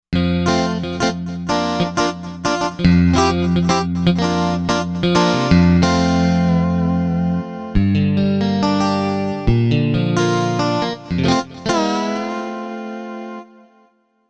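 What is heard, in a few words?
Guitar Comp Strat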